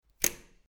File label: switch